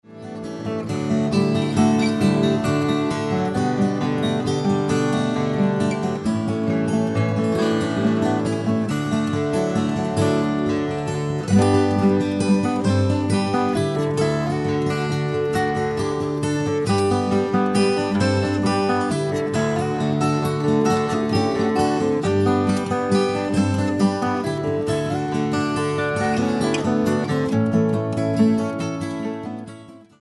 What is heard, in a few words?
waltz